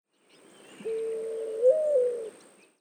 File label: Mourning Dove Song